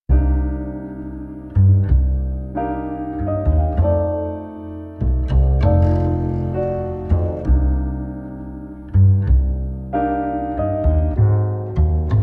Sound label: Jazz